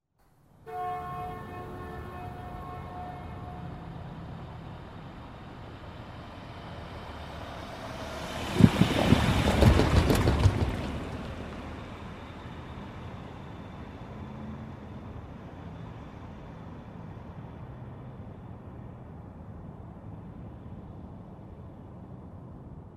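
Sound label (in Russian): машина пересекает железнодорожные пути переезда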